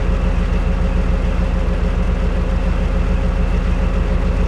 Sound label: chieftain engine rpm 00 load, tanks; War Thunder